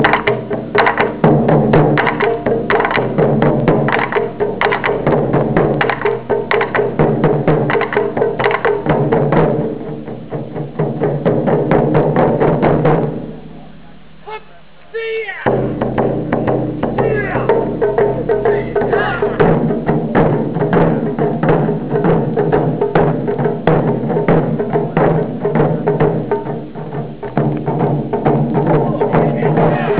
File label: taiko